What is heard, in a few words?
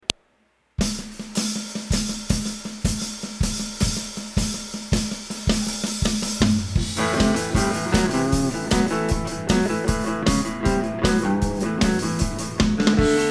Ambient Guitar Mix